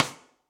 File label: Snare Sidestick Big Punchy; Drums; Drum Hits; Standard Hits; Ableton; Session Drums Club